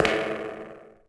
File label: tile